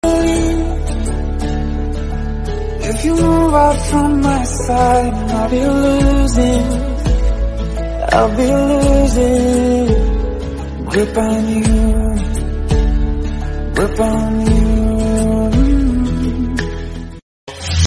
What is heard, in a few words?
english songs background love music ringtone